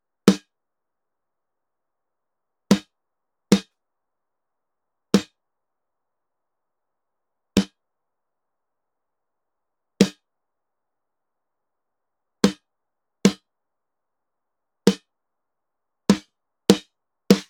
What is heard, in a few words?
snare with; auburn sound renegate